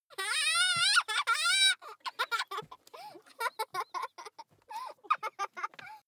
child laugh tickle strained

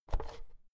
oven knob press in hard

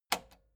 metal button spring click slow

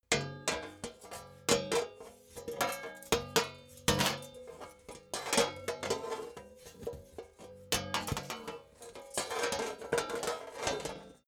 metal plates handling jingle

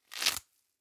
velcro open slow

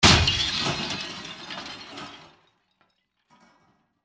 car crash

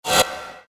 short movement sound

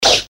fight kung fu swish

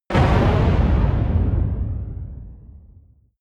missile large fire stationary distant

